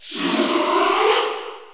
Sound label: Cheer French; Shulk; SSBU